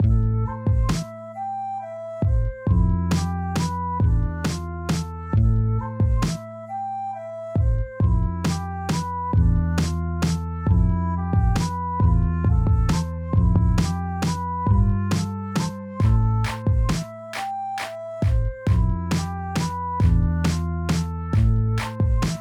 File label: beat